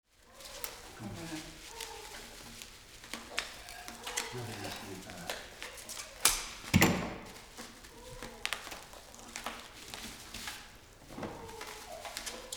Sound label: Wrapping Art, Alajarvi Finland